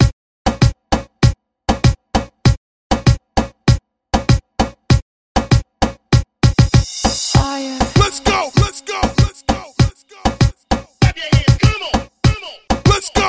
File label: Top40 Pop Latin Mixshow Party Break Into Re Drum 98; Mainstream